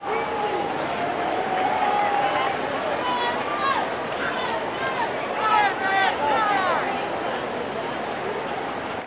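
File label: baseball crowd ambience chatter; Crowds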